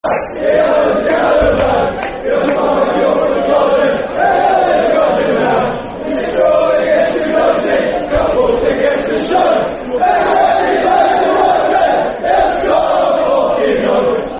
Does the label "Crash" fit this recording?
No